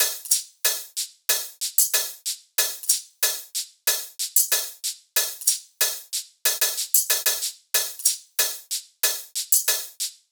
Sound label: hat loop 93, Drums; Pop; Hat Loops; Slate; SD PASADENA Pop Sample Pack